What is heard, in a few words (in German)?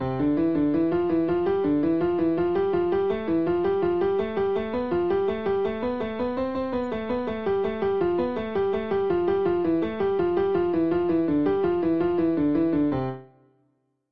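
Fingerkraft Übung, klavier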